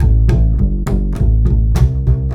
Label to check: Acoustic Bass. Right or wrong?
Right